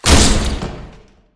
crushed

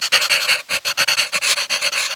writing; sorin

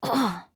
groan; peko

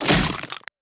bite

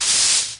powder

